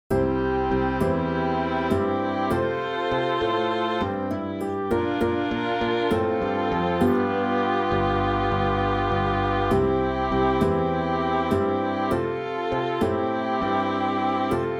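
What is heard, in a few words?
Low Accompaniment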